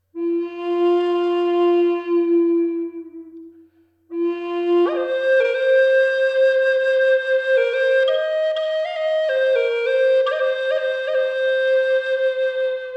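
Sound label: Eastern Red Cedar Fis 432 Hz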